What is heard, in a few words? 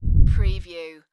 Monster Footstep